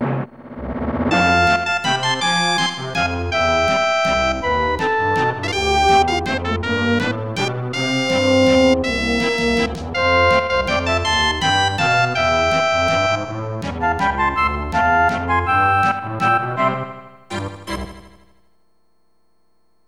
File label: Party Waltz